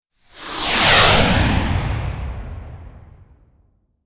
Missile flyby deep sonic whoosh; artillery

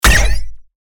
Sci fi rifle futuristic single shot

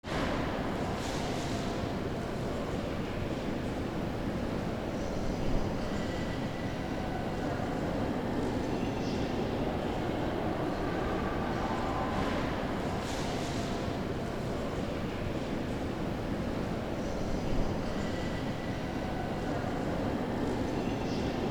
Museum entrace ambience main hall empty hall

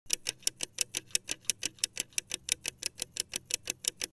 Passing seconds ticking time; shorts sound effects

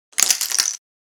Darts dropping on floor; sport

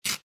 Paper ripping quick

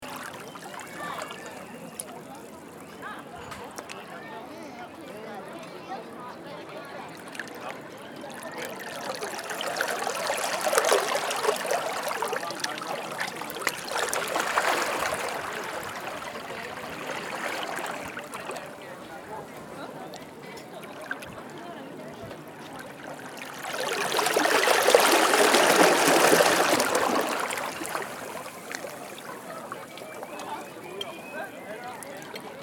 Gentle ocean waves people chatter

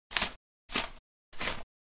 American dollars banknotes count out into hand

